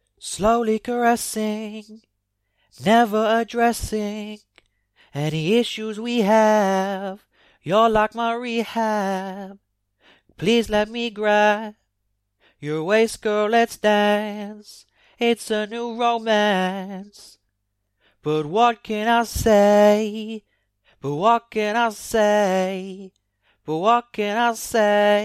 pop 120 Abm

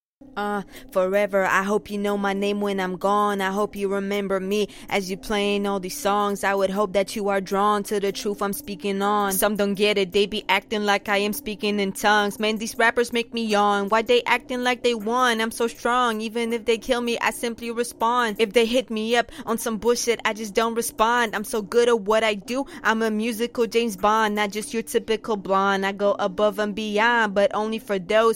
Acapellas, 163BPM Key Am

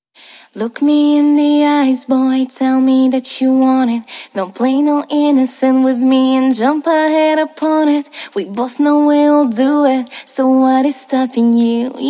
Gm 60 pop rap sing

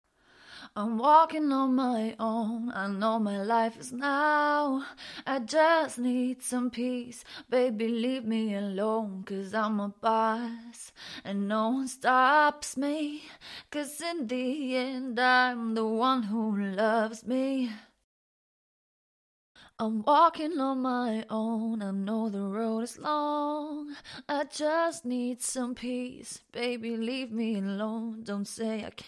pop rnb female 75 Am

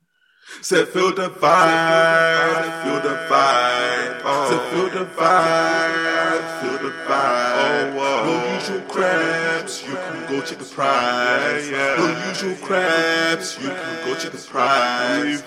trap 128 Bm, Acapellas

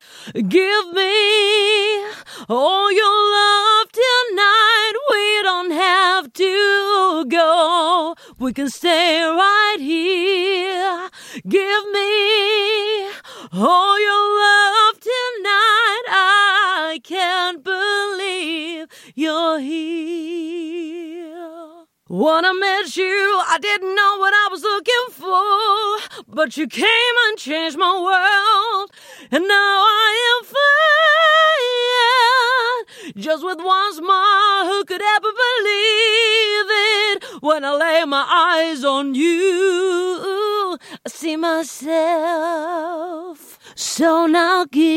Lo Fi Hip Hop pop 87 EM

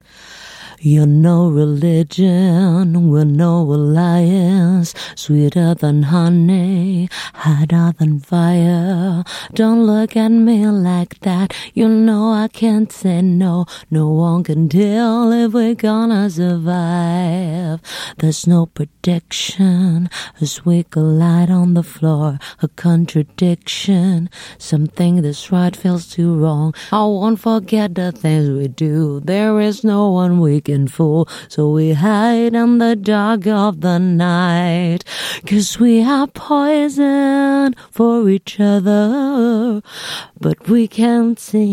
RnB pop 111 BM

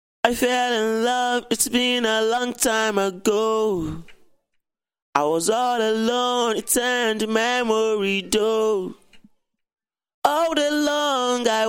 pop female 95 DM

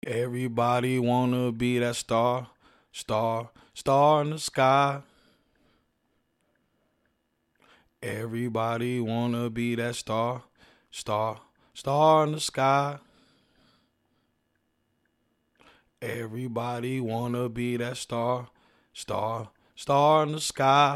hip hop 118 Dm, Acapellas

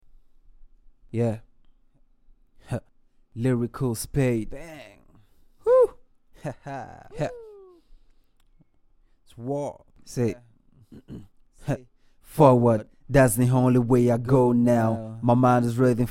hh 80

